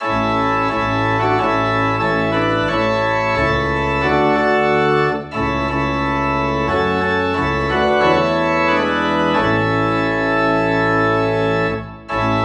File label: Organ in A; Will Jesus Find us Watching